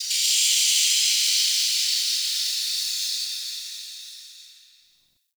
Relay Steam Blast; Warframe; Ambience; Hub; Tenno Junction Relay

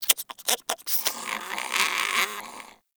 Rodent Vocal Tranquillized; Warframe; Hunting Critters; Characters

